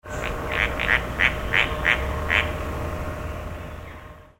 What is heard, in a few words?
Tityra cayana var, La Vencedora